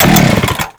chainsaw

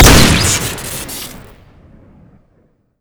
shell shock shoot crit

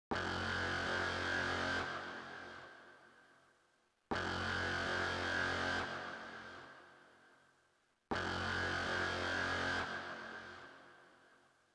rocket alarm

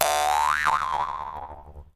boingg; vaulter; mvm forever

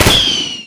fireworks launch

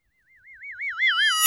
money vanish reversed; mvm

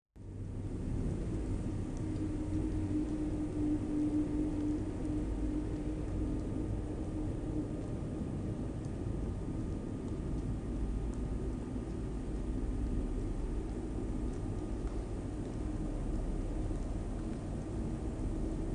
spooky ambience

